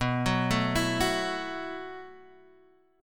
B 7th Suspended 4th B down Guitar Standard; B7sus4 arpeggiated; chord shape; JGuitar

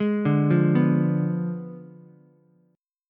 F Diminished 7th F down Ukulele; Fdim7 arpeggiated; JGuitar

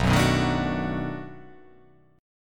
B 7th Sharp 9th, down

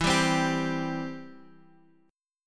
C 5th E; C5/E strummed

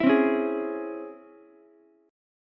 Db Minor Major 7th Ukulele, DbmM7 chord; DbmM7 strummed; JGuitar

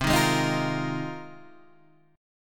G sharp 6th Add 9th C

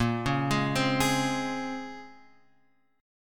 A sharp Minor 9th, A sharp; A#m9 Chord {6 4 6 5 X 4} arpeggiated

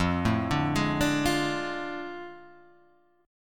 A Major 7th Suspended 4th Sharp 5th F

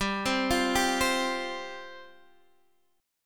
F Suspended 2nd G

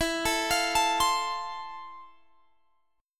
F Major 7th E

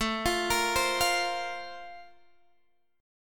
A sharp Major 7th Suspended 2nd

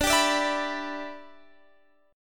E Minor 7th D

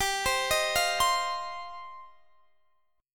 G 7th Suspended 4th Sharp 5th, Guitar Standard; G7sus4#5 arpeggiated

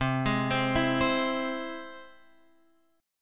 C Augmented, Guitar Open F, chord; arpeggiated; x,7,8,7,7,7; JGuitar

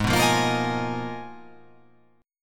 G sharp Minor 6th Add 9th

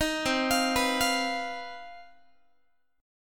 C Minor Major 11th, C, Guitar; arpeggiated; CmM11; 18,20,20,21,21,x; 4A,4E,5A,5D,5G#,6C#

